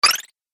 Game Flutter Squeak; video game

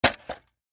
Telephone Rotary Pick Up Antique; Technology; Electronics

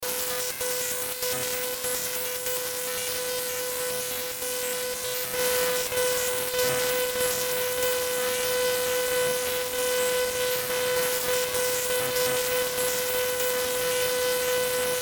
static digital; Static Digital Beeps Fuzz; Production Element Imaging Element Accent Transition; Blastwave

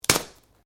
Branches Dead Snap

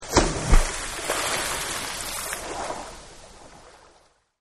Splash, Pool; Pools; Partners In Rhyme

